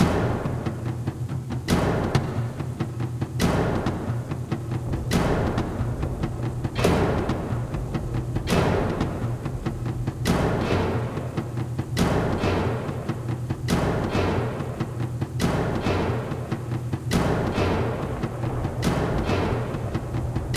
Music Storm loop; gamerip; shu; ps vita ps4 switch windows

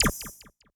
Menu Cancel

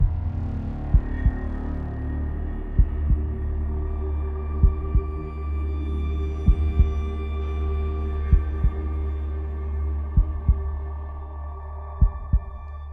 Mood Suspense

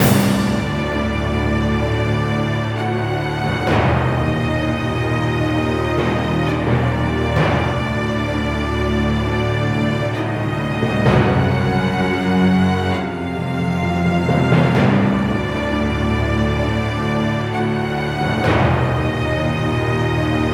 Desert Level loop; Depard; Gems of Rezrog

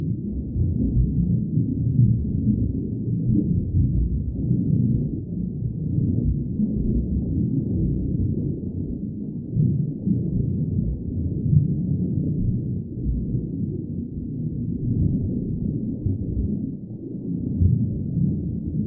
Underwater; CastleMiner; Xbox 360

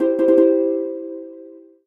Solo Harp Positive; MUSIC EFFECT; startide

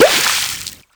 balloon pop; Spanish; water balloon

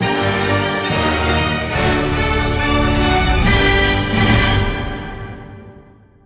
Battle Cleared; mario rabbids kingdom battle the complete gamerip switch gamerip 2017; Icicle Golem

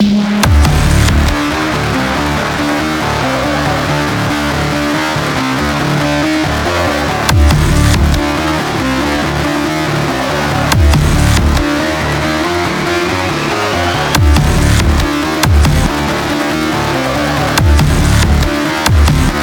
heavy; Doom; VEGA Core

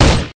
Rifle; MilkChoco

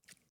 water jump

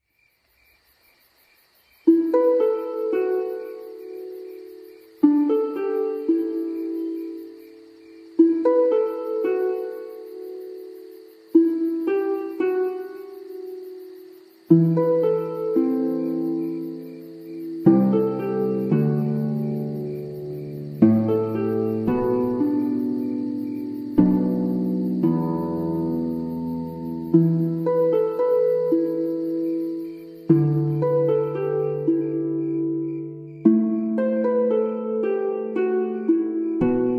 Beautiful Forest Elf Music; obscure challenge your mind

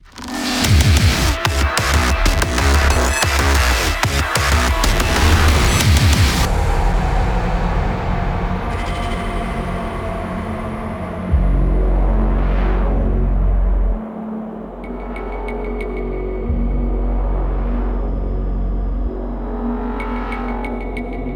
Victory; Doom; TransistorFist

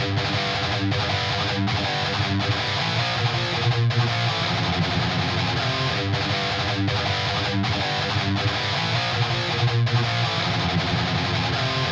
Battle GUITAR C

